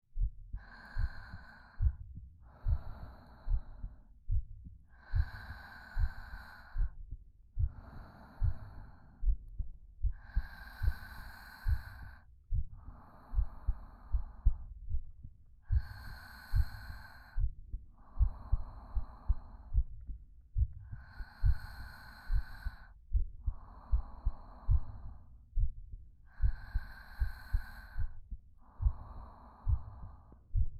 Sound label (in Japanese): 心音（ループ対応）